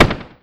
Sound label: Ar Fire; Cruelty Squad